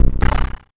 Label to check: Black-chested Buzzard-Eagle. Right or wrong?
Wrong